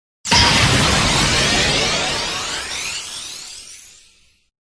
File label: Pokemon Out; Anime